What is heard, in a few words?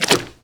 fs fm paint, footsteps; player; Portal 2